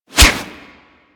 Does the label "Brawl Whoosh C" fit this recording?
Yes